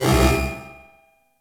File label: Head Track, Ambience Props; Hub; Simaris